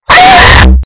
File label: A scream